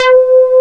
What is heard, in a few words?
FAT C5, SYNTHESIZER; AKAI S6000 CD-ROM - Volume 1; GLUNGE